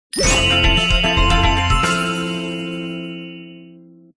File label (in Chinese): niu win